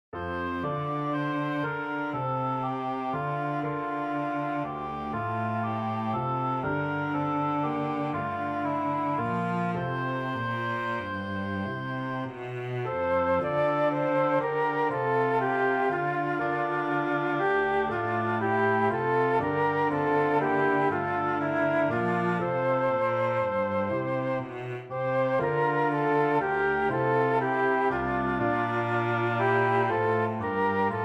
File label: Piano Flute Horn and Cello